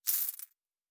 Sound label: Coins; Fantasy Sounds Bundle